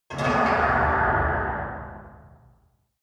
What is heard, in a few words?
metal hard clunk